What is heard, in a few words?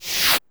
CHARA RESULT SMALL; maimai